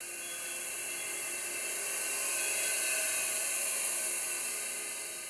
cryo clouds, ambience

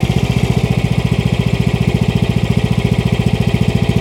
Welder Idle; ambience gnarled forest

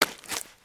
Foot Mud Walk Light; footsteps

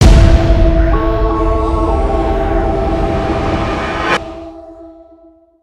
binocular space mid, ambience spaceship

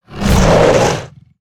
Flap Fire Fire; creatures organic; Bruno

